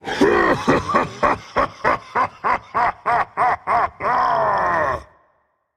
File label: laugh rage; chaac